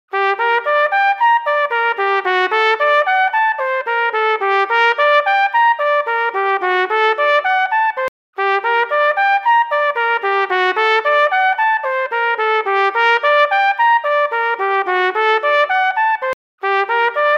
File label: Riff